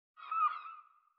damage hits explosions, Warships

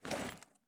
prone asphalt; Escape from Tarkov

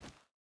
footstep boots forest jump start; Rust

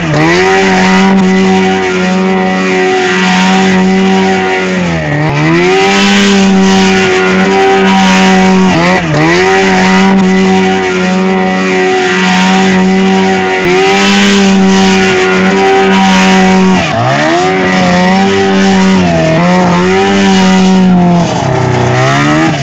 vehicle snowmobile sustain

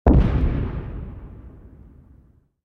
tank explosion far; explosions; War Thunder; tanks

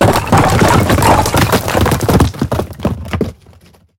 building fall mid

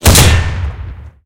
tank hit; War Thunder; sfx tanks

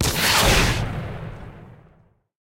rocket start; guns; War Thunder

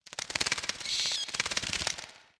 bat; World of Tanks Blitz; karelia halloween

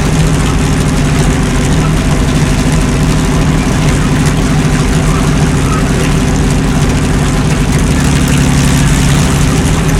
panther engine rpm load, engines tanks; War Thunder

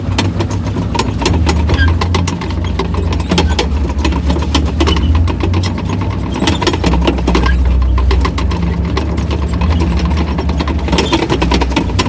tracks light speed, tanks; engines; War Thunder

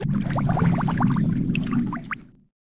Underwater; Civ4 beyond sword

